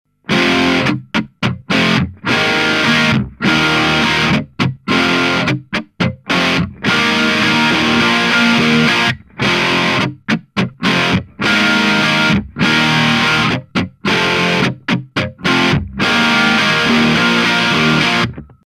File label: reference x-treme tone crunch